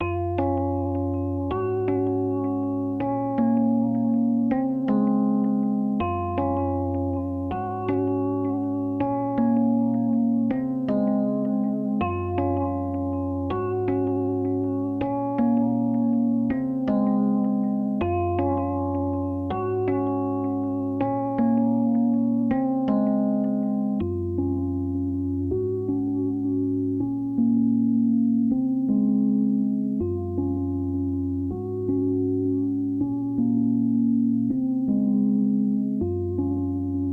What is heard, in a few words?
80 BPM F# Min Rhodes, Lofi; Melodies; Loop Stems; Cymatics; GHOST (Beta Pack); Blue Wish